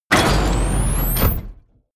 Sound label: sci-fi plasma cannon startup